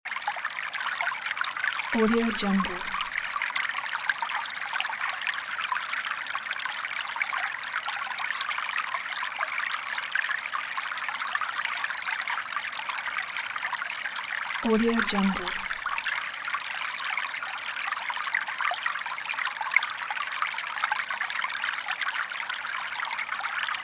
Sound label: stream water